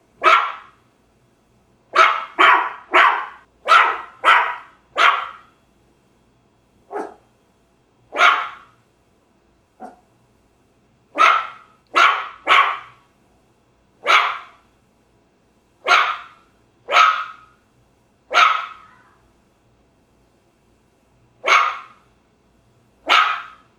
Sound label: small dog barking; daniel simion